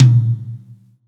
acoustic tom; acoustic drums

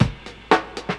Old School Break, Drums

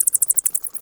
bat attack; theGoonies; Retro

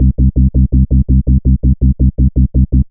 synth; pitchshifter PSI

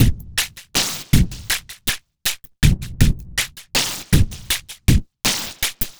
Electronica Drumloop; Drums

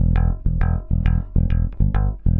Electric Bass; Basses

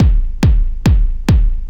Kick Loop, Drums, Dance Beats

